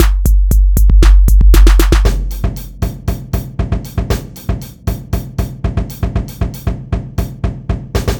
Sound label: Groove Pizza 117bpm